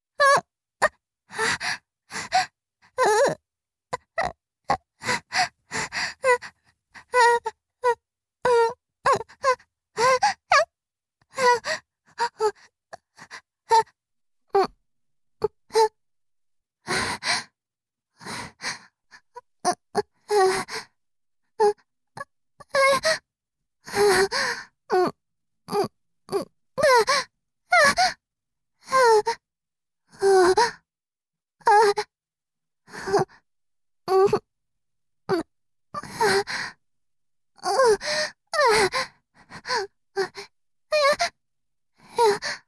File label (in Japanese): 自慰(中):胸や性器をいじる; 実用ボイス抜粋集